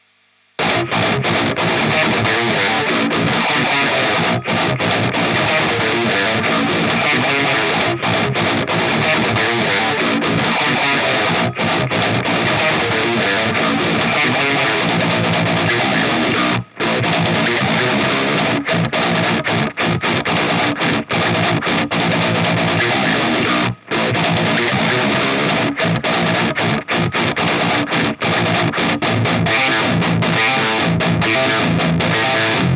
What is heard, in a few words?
YELL SM57